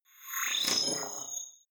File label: movement creepy robot